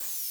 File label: Menu Zoom; Menu FX